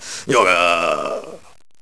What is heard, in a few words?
yogaah